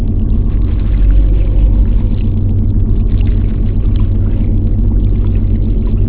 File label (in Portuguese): Gravação lancha cabinada